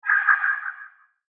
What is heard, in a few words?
Android Laser Eyes, robot; science fiction sound effect; Andrew Fleming; Twistedtracks